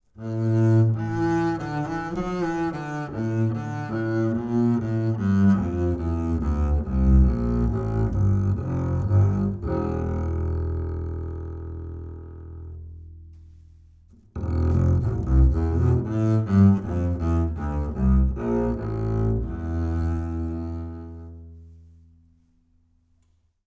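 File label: acoustic bass Bowed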